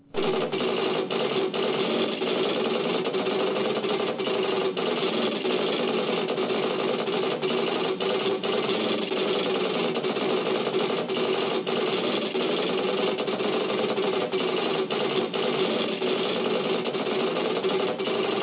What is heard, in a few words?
library computer; startrek